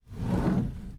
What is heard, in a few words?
sliding door CLOSE